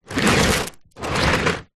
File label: foley container tub plastic pull drag scrape concrete light grit